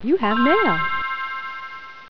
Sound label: New Mail; eWorld